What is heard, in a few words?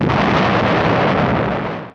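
EXPLO, explosion; DEMOUK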